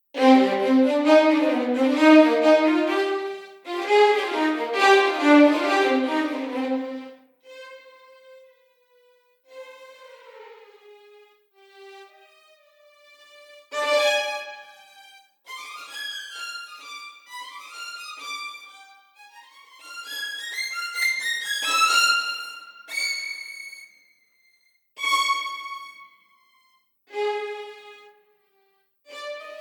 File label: First Violins Test Close; LSS